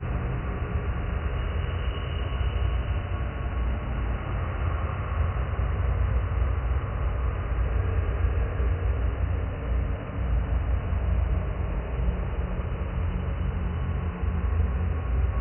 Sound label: Main Ambience